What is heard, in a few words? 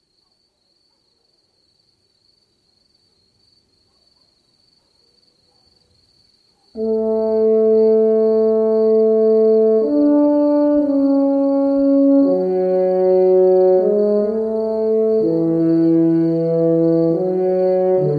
Funeral Horn; rusty lake roots b side